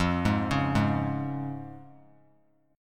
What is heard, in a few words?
F Minor Sharp 5th, chord; Fm#5 arpeggiated; JGuitar